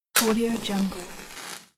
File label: match